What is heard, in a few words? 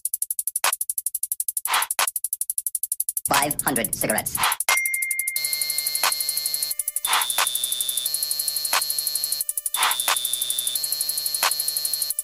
With Music Beat; Content Creator